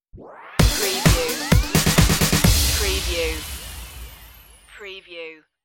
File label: glider stinger